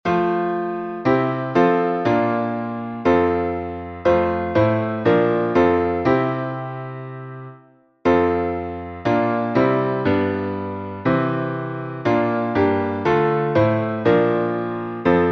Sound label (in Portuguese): salmo playback